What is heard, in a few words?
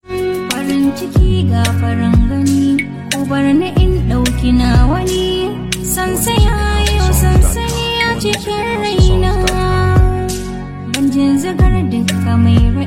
Hausa Soundtrack